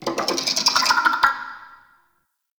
Interior Spider Clicking; Ambience; Warframe; Duviri; Murmur

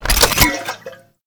Mech Oil Grenade Create; Warframe; Nechro Mech; Abilities

